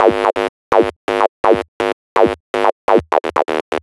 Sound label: Dirtier Bass; Sonic Foundry (Sony Creative Software); Underground UK House & Electro